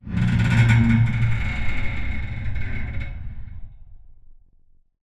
crane movestart metalstress; Titanfall 2

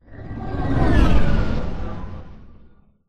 deploy from mega; Titanfall 2

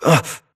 grunt hits; Titanfall 2

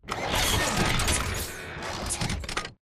pain knockback backward; Titanfall 2

